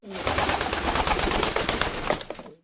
bird pigeon flap

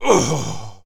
male death; ufoai; soldiers

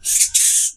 rat angry

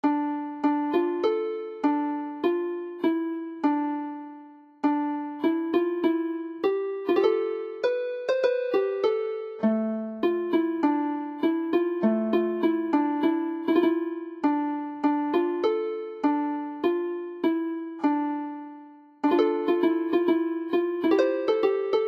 medieval harp; stendhal

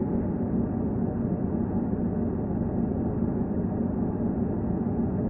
engine alien small

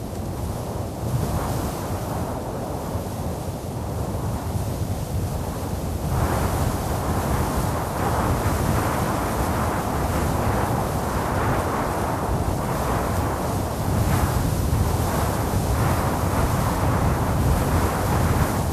wind; weather

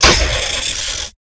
electro taser; ufoai; weapons

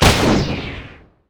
bullet heavy bolter; ufoai; weapons

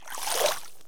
splash, footsteps

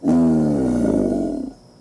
alligator

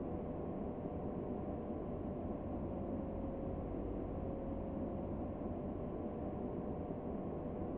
wind turbine

